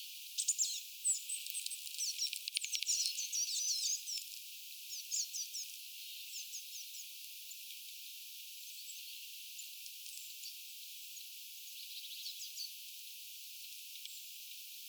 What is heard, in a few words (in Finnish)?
ilm kaksi kuusitiaista